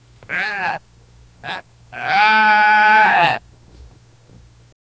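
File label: strong and energetic horse, farm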